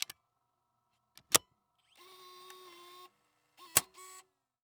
Technology Electronic Camcorder Panasonic Dvx100 Tape Ejecting